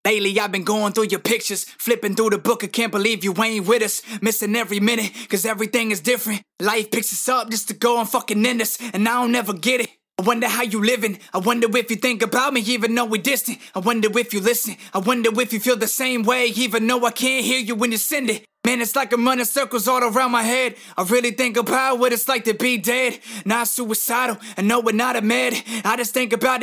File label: acapella, HH 130 Bm